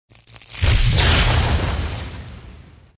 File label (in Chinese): leidian; effect